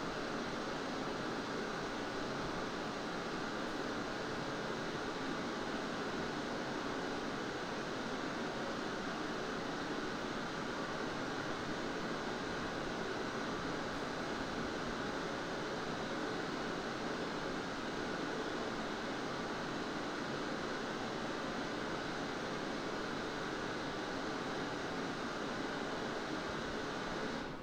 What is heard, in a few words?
Signal Noise